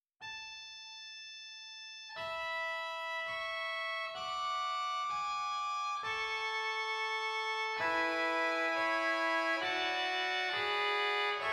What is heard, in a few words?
Bovenpositief Trompet 4; zwolle